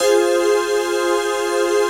CHRD PAD; Best Service; XX Large Pads; Sample CDs

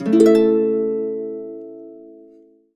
HCAMAJ C#2, Complete Harps; USB Soundscan vol.62; AKAI

